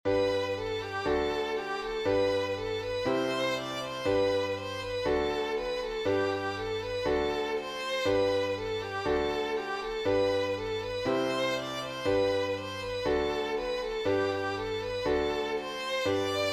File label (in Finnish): priäzhän katrilli